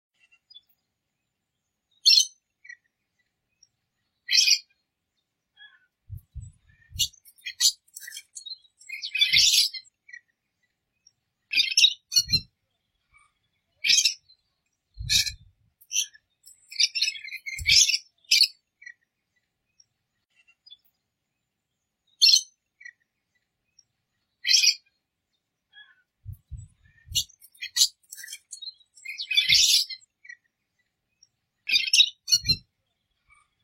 canto do loris arco iris

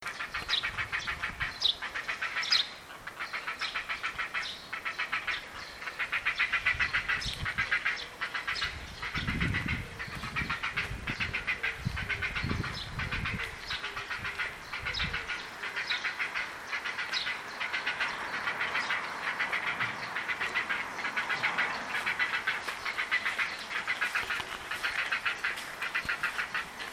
canto da garca real europeia

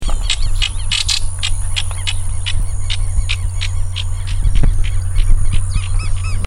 canto do martim pescador verde

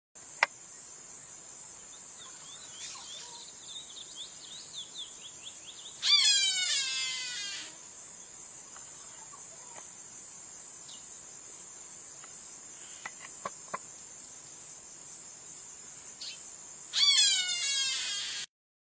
Canto do gaviao do igapo

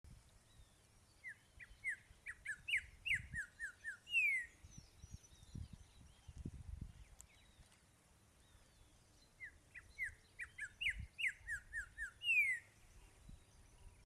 Canto do trinca ferro pantaneiro

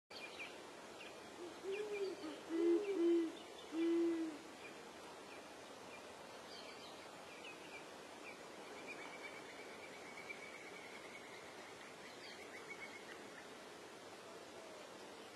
Canto da coruja jacurutu

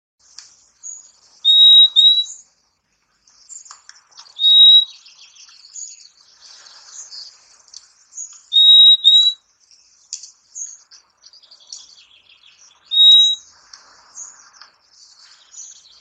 Canto do Fim fim

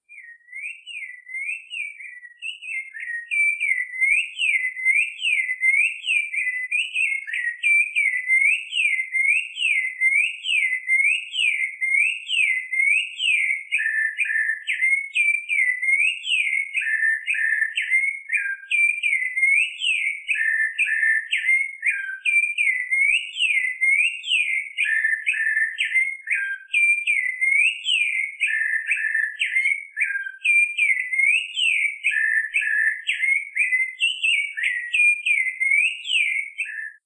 Canto de Sabiá laranjeira

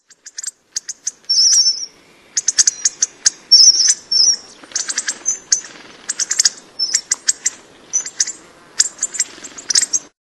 Canto do Bico Grossudo

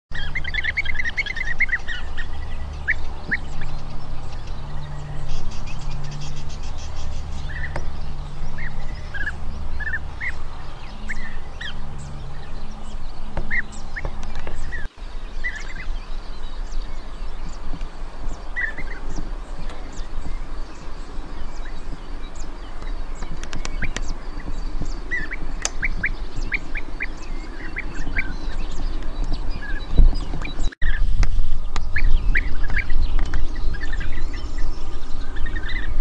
canto do abelharuco verde

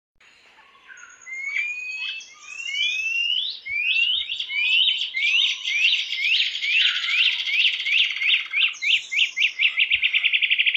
canto do ipecua

